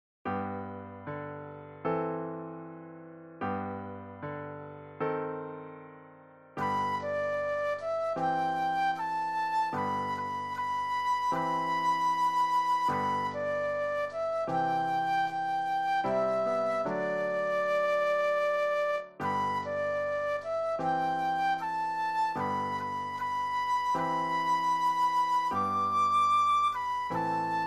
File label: Flute Solo; christian solos